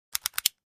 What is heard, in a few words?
Flash Gear Four Stagg, flash gear